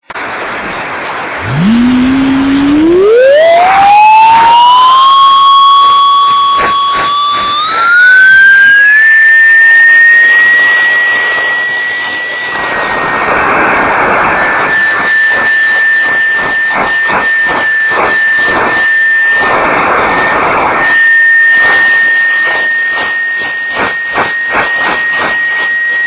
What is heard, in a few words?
UNITEC-1 in orbit cw signal